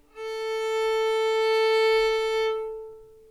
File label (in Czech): housle d dur